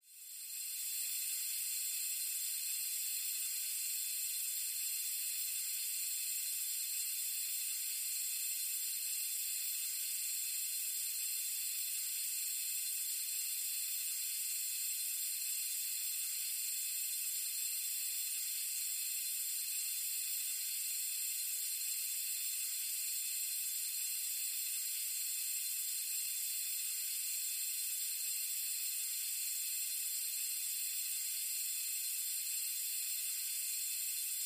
Gas Leak E Stdy Fuel L

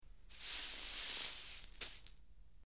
Light Sweeping Up Gla, cleaning, sweeping

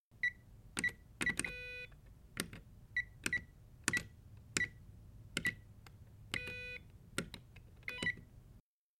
Alarm Keypad Entry; hi-tech-various

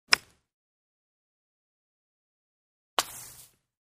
Golf Wedges Swing Hit

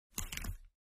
pager holster

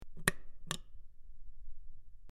Button Press In Out Sp, switch clicks

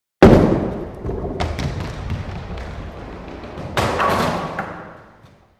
Bowling Ball Rolls

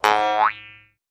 Jews Harp Plain Boi, boings

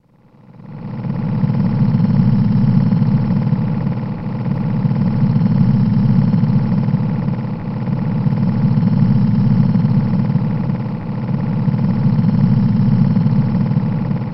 Additive Synth, electrical

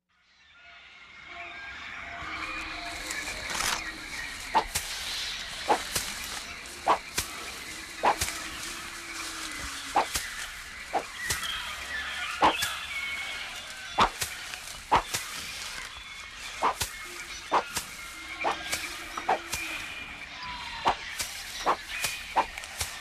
Machete Hack Jungle

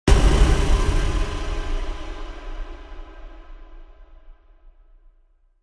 Impact Metallic Thud

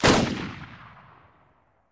Machine Gun Sngl Sho, single burst

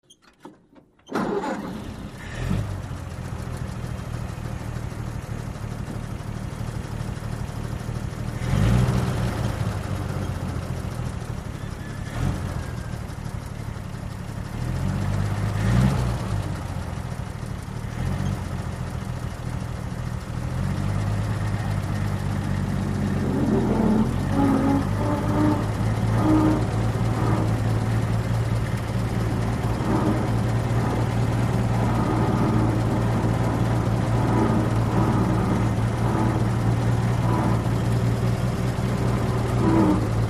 1936 Ford Interior, old v-8; ford truck old v-8

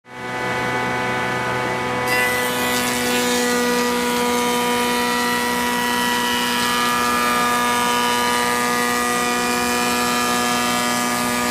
Medium Buzz Saw Cutting Wood

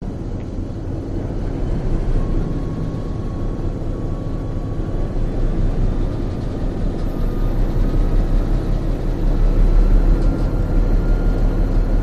Loco Int Rev Up Looped, train int

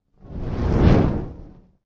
Fire Ball Catapulted

